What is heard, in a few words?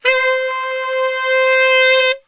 C 2 SAX, Brass Super Section; Best Service